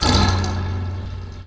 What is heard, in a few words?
bedspring, Cartoon Film Effects